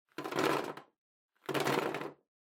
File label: Drawing Box, Ball Put In